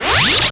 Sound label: scratch, Yamaha DD-8